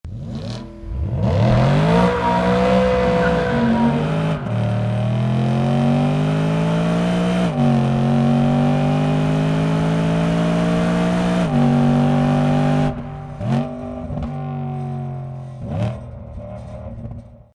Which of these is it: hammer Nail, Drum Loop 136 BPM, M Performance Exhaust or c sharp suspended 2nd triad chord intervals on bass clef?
M Performance Exhaust